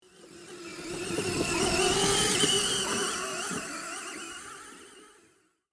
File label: RC truck movement pass by; rc toys